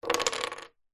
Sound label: foley wood blocks small x4 set down together on wood table